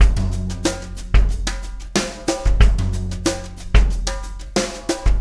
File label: Beat, Loops